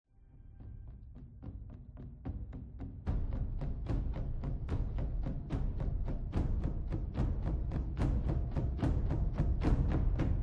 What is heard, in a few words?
drums; jumanji; the game begins